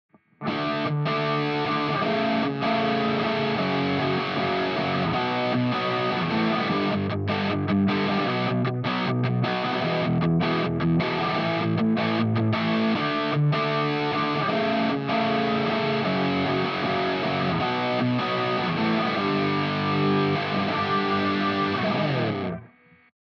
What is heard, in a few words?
Tone Shaper Guitar Off